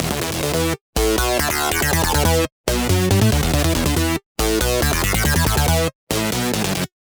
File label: Fill Acid 140 E; Fill Acid3 140 E; exciplex sample presets pack; JinoBeats